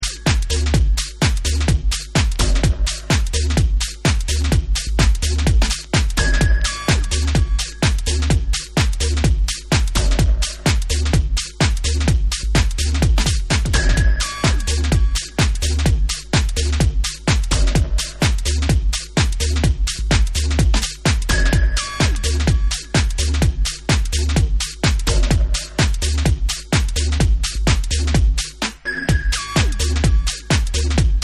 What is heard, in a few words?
Instrumental